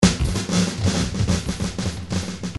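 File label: drums gogo fill; Paul Kodish Dangerous Drums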